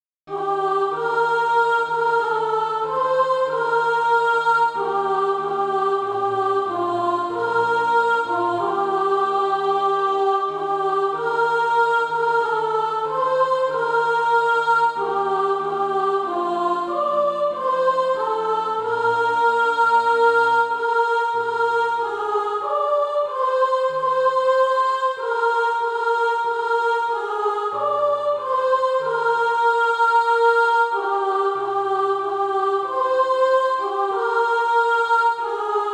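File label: soprano, Practise Music; For Christ Is Our Endeavor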